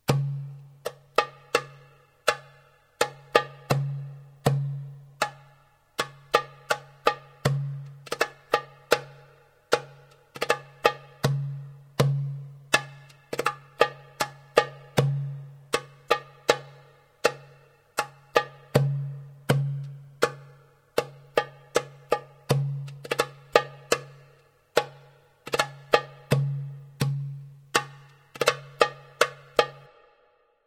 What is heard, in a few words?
darbuka